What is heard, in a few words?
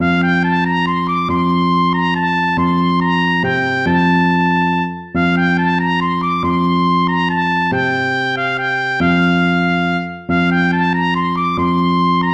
saltarello; praetorius michael